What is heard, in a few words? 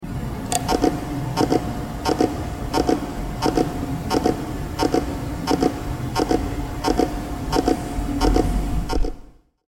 Hitachi HTS541680J9SA00 Headcrash